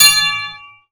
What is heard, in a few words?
start race